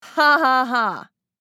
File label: Female fake annoyed laugh